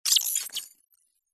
robotic game notification sci fi military robotic robot cyber futuristic